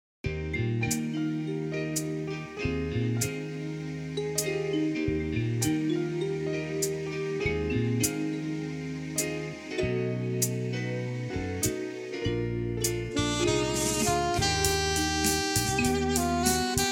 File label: Alto Sax